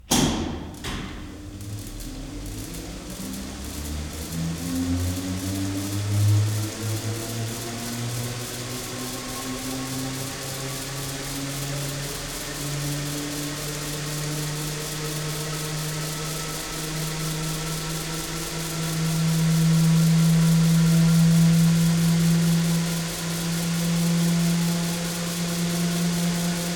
Big Machines Exciter Machine Siemens 1945 Start-Run-Stop; MKH8040; Shapingwaves